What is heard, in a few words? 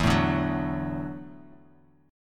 E 9th Suspended 4th Guitar Open F; strummed; JGuitar